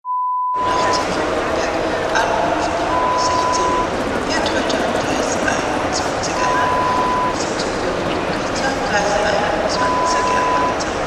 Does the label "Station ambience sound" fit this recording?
Yes